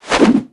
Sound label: comb in